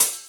Open Hhat, Hihat; Equipped Music; Loungin House

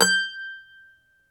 mandolin

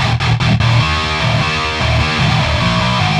Guitar Riff D#

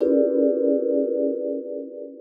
clashing vibes

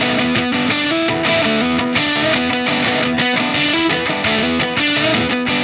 Static FX Guitar

